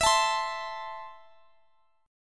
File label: E Suspended 4th Sharp 5th E, down, Guitar, Standard; Esus4#5 strummed; Esus4#5 chord